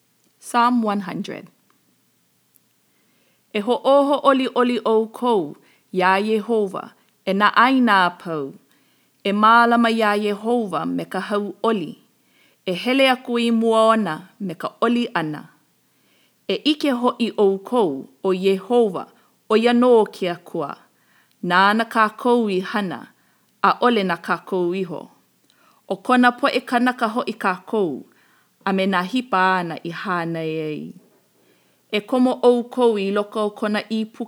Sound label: Psalm 100 Hawaiian